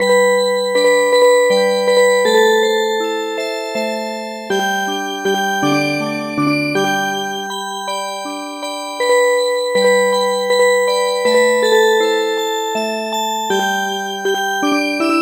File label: Music Box, lullaby; My dear mothers child